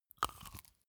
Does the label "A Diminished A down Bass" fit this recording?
No